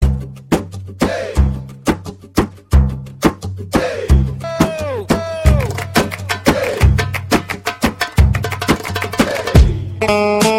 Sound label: Turkish Tonne